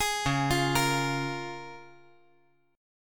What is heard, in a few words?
Db 6th; Db6 arpeggiated; JGuitar